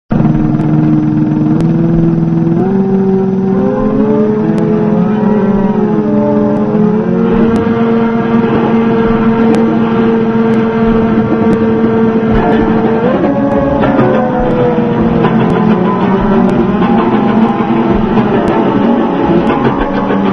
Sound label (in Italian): risveglio città; suoni futur musica; Russolo